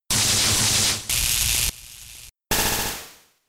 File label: Thunder; Thunder Wave